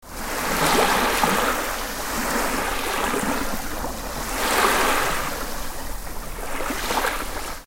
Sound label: water